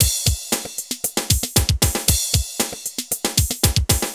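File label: drum loops, right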